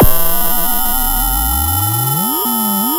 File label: pop, sound of a bike bell